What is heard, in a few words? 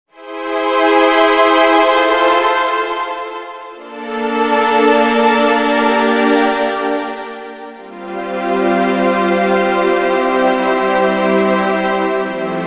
Orch Hybrid